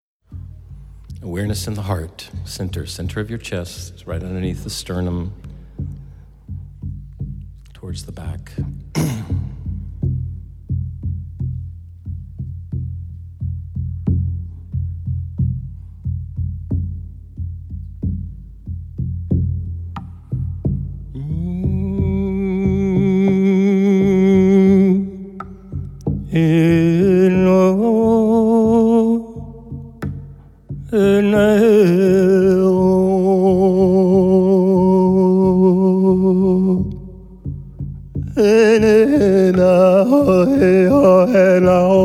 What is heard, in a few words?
Sound Meditation; Fourth Chakra Anahara